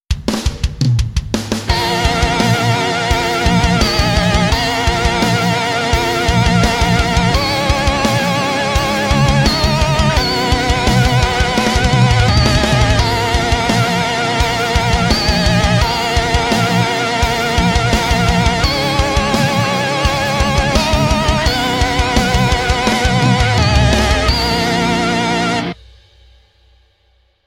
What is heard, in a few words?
synth voicing custom depth